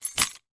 household bunch of house keys drop

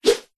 sport tennis racket swing swoosh whoosh air; zapsplat

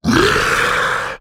Screams Shouts monster processed; soundbits

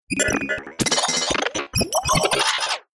science fiction cyberpunk robotic glitches

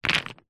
stone medium sized set down on others

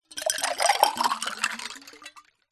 cocktail shaker metal pour ice liquid glass; food drink

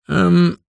human male middle aged says umm unsure; zapsplat

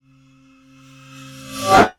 Swell Metallic; bottlerocket

